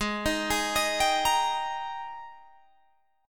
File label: Ab Major 7th Suspended 2nd Ab Guitar Drop D; 18,18,18,20,20,18